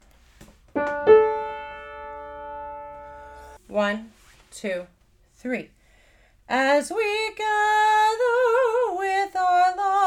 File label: Confirmation Hymn; confirmation recordings